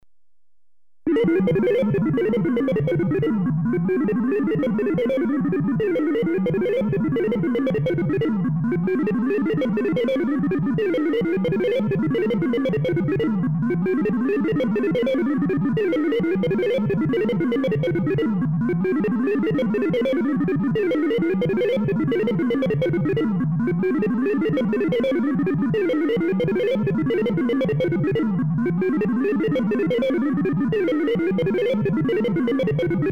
Talking computer (low pitch, harmonic), Electronically Generated Sounds; Sound Effects Library